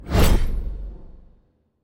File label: giant fire swing; smite; vamana